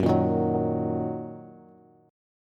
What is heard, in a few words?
Gb 6th Gb Bass; Gb6 strummed; JGuitar